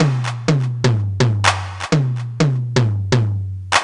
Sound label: perc bits 125, Percussion Loops, Classic Chicago House